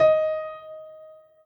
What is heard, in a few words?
piano